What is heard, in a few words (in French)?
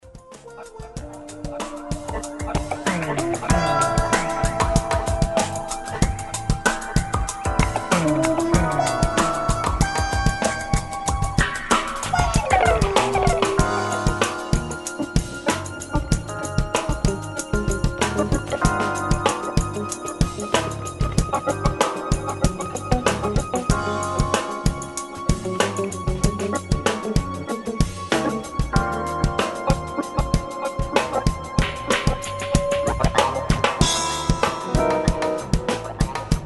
batterie